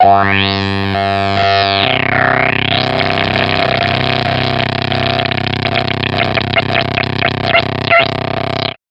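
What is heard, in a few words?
Fuzztest guitar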